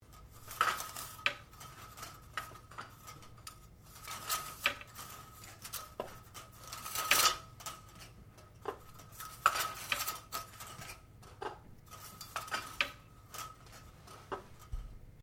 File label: Blinds Venetian Movement, Household blinds; Living Room